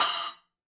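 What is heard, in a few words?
Cymbal B Finger Stop; Latin Percussion; Drums; Drum Hits; Ableton; Factory Packs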